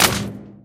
impact wpn small metal

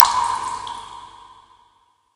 drop in pool, ambient

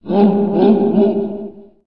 rnd swamp, ambient outdoor

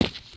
asphalt short, human step

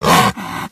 psy attack, pseudodog

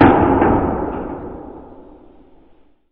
hit, underground; ambient

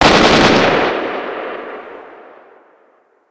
ak74 burst, outdoors

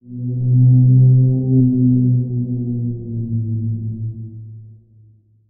moan, ambient outdoor

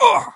wound, stalker

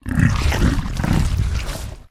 flesh eat, monsters

